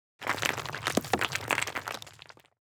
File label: COLLISION CONCRETE; SIMUZENSOUND; COLLISION MATERIAL